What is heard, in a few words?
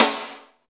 SD, Drum & Bass, 160 BPM